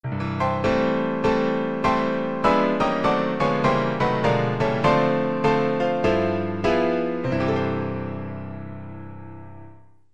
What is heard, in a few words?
piano